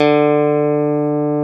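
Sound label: DAMP GTR D3, mute guitar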